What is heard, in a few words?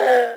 screams human